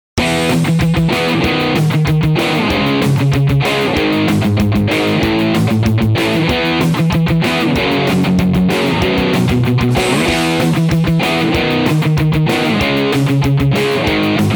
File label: Amplitube4